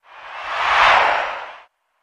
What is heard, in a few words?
Football Crowd Dopp, sports arena football